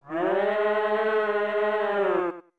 Shout; The Four Giants shouting (2 shouts, 4 pitches each)

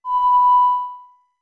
Ocarina B med; OOT

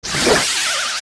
Die; Wallmaster

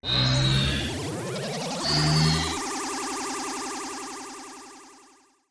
Sword Charge; Zelda; OOT

